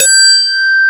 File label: QUITAR